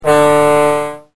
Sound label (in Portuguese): BUZINA